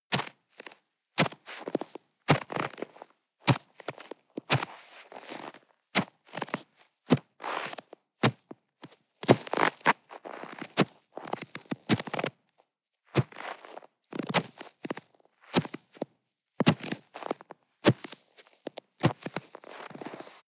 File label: step snow walk slow hard; natur-tiere; schnee